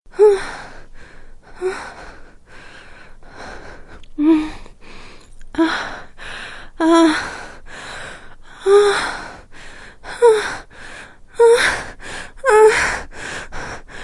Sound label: girl moaning sound